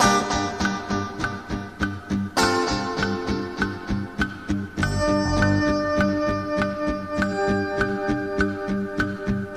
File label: keys